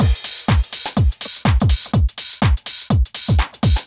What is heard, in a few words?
124BPM Full Loop